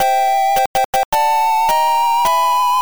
Level Complete; Super Mario Land; Gameboy rip

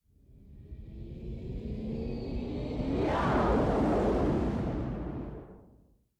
lightfill; Plants vs. Zombies

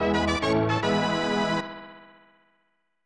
Game Start; Otoboke Ninja Colosseum; SNES

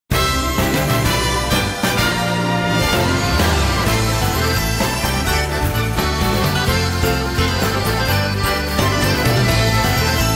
victory theme; bravely default ii

